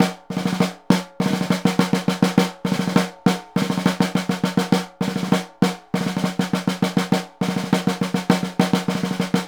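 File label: snare, Synclavier Percussion; Synclavier Percussion 2; World & Orchestral; ILIO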